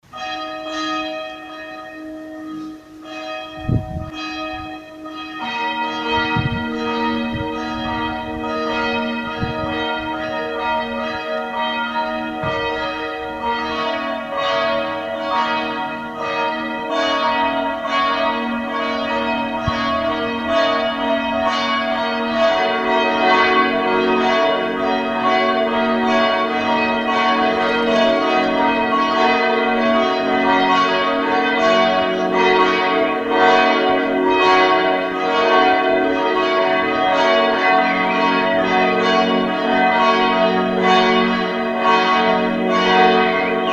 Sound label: fis1 ais1 cis2 dis2